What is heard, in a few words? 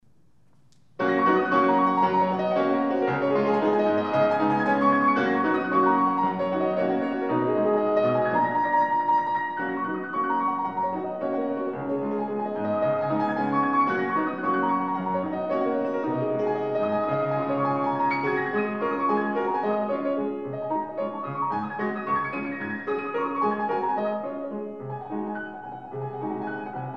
Pro Piano; Altan Pro Piano; Chopin Black Key Etude